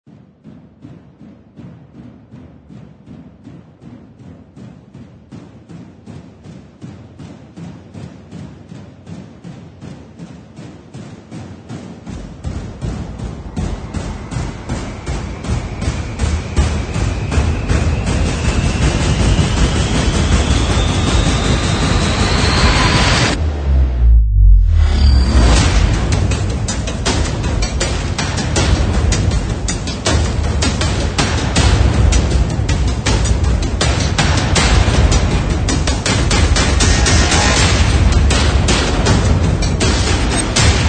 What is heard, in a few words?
Hybrid Trailer Percussion and SFX